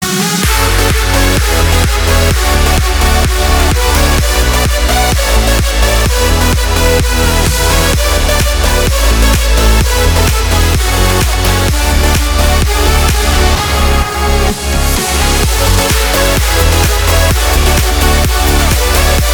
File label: melody